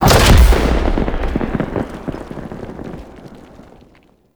Interior Orbital Station Pod Eject; Warframe; Tau

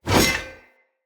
Whoosh, Swords; Weapons; Mockery; Turash

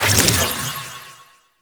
Shrine Assemble Frag Collected; Warframe; Duviri Murmur

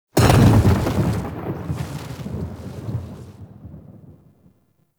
Loop Respawn; Warframe; Caliber Chicks Minigame; Transitions

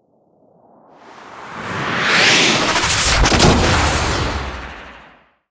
Archwing Fly By; Boarding Rail Jack End

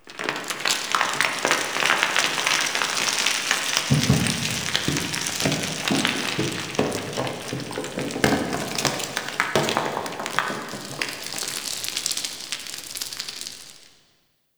Cave Rock Slide; Ambience; Warframe

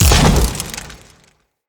Sentient Death Explosion; Sentients; Sentient Movement

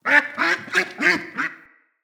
Freed Duck Call; Encounters; Animal Rescue

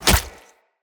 Hunter Bow Arrow Impact Flesh; Weapon Impacts; Bows; Envoy; Combat

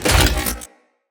Iron Blast Charge Complete; Warframes

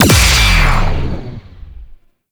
Overguard Depleted; Warframe; Eximus

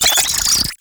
Oraxia Brood Spider Vocal; Warframe

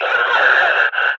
Enemy Death; Warframe; Lasrian; Battle Barks

